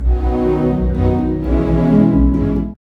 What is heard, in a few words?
HAPPY, ORC Happy Hour; East West - Scoring Tools